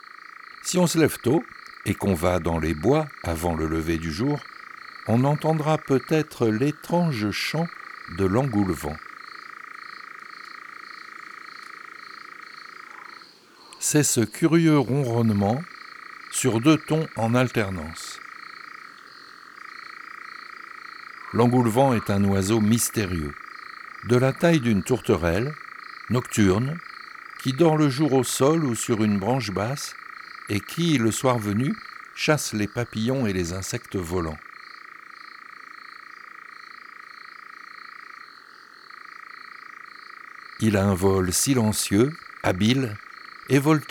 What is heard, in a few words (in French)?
L Engoulevent d europe, chants d oiseaux